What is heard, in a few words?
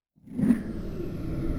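Music Dpad Evaporate 145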